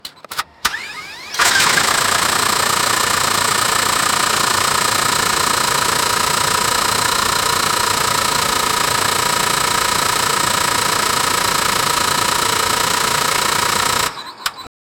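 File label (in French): moteur de F1 a l'arrêt; motorsports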